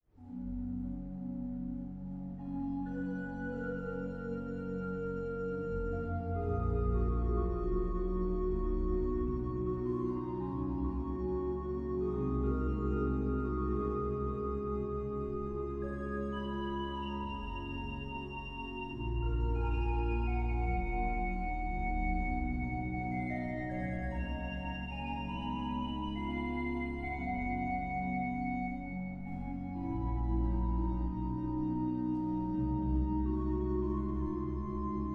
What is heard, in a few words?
Cantilena over Groningen orgel